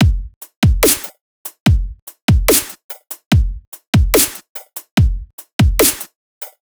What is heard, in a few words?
145 A Drums; Touch Loops; DigitalSpectrumsMini